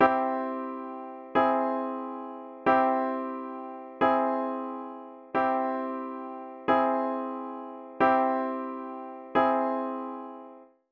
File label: C Minor Vs Augmented